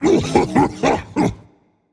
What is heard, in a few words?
clear throat rage; chaac